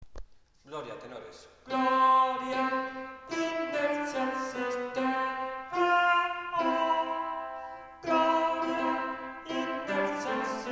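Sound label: tenor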